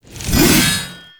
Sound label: Backstab Impact; Warframe; PaxDuviricus